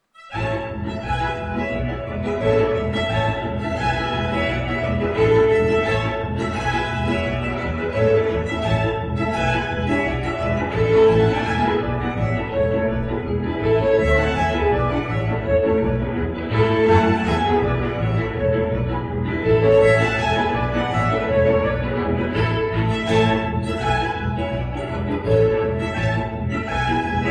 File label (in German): volkslieder, rutscher